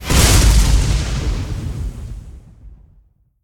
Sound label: death lightning strike; Smite; Susano